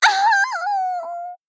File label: Female Gnome Death